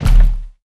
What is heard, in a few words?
footstep; anhur; smite; anhur skin13